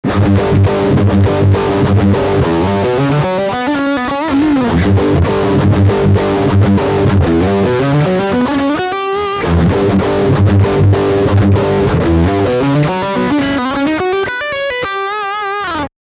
Riff Bridge Humbucker

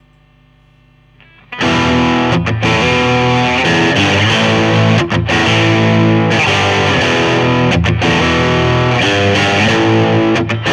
Mid Boost

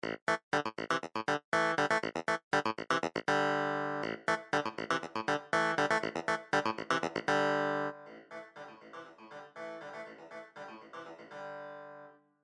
Lexicon Room Clavinet

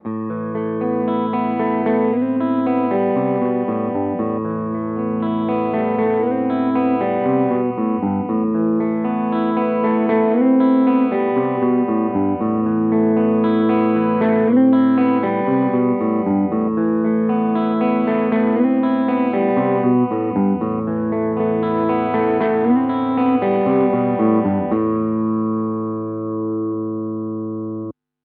Godin Radiator Burst crunch both dark

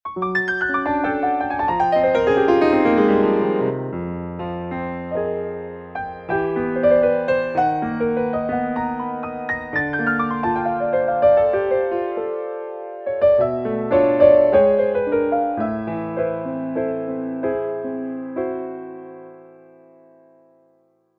Acoustic Piano

